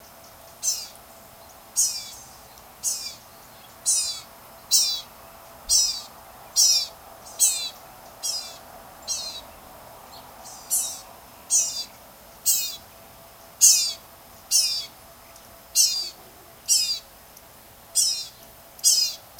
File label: Blue-grey Gnatcatcher Polioptila caerulea obscura